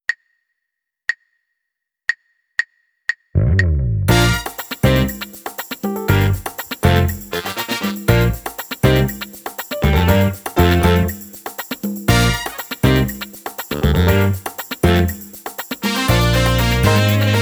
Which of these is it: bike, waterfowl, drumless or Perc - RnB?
drumless